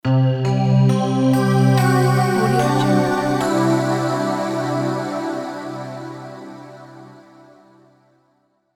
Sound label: dreamy synth appearance